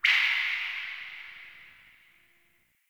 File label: Sentient Lair Drip Interior Small; Warframe